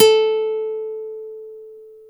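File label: NYLON A 4, guitar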